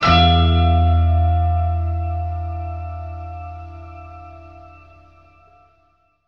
slow climb, stabs

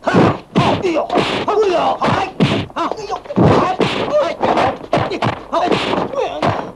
martial arts

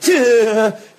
huh lose balance, Voice; ooh ahh

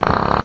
fart medium

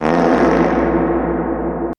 fart short

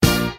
fire

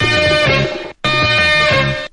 two two hits, Stabs

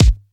hummer, Kicks Electro

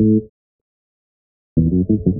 bass lead in, Loops, Phonky

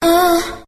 "uh", sung; ooh ahh

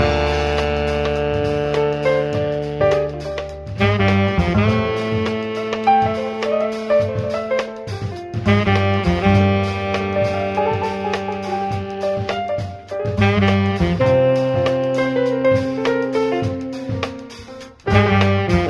sax and piano with light drums, Loops; Phonky

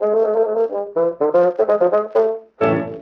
horns organ at end, phonky loops

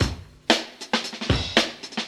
reach out your hand, Drum loops Boombap

